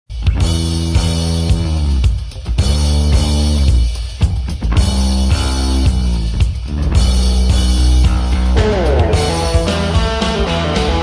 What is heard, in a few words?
rock guitar solo